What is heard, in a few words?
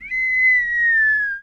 Falling bomb